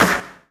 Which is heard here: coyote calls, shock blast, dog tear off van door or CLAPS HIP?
CLAPS HIP